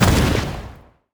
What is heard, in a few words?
warlock ranged impact